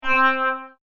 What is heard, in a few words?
High Pitched Fog Horn; Horn Sound Effects